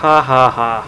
HAHA

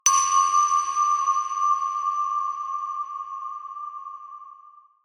reverb chime

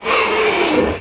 fingernails blackboard

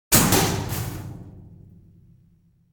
metal gate slam

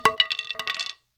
stick drop

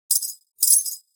sweep shards

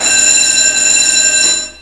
SCHL BELL

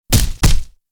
double kick

